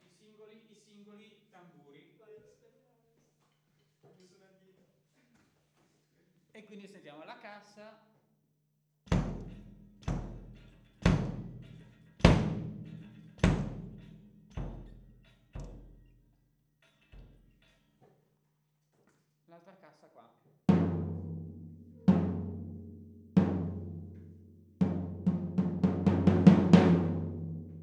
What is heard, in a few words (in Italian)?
batteria condensatore